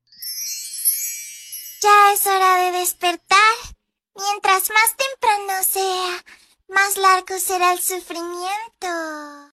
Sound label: mario bross ringtone